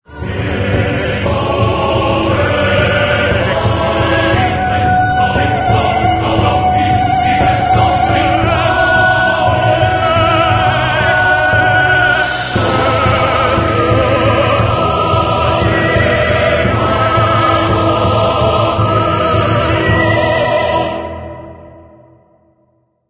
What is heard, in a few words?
battle; Yotsume God Reunion